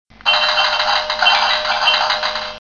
tools pinball

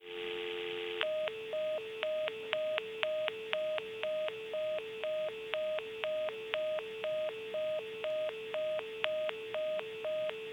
Phone Off Hook Tone

